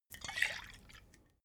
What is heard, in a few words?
bottle vodka upend; berufe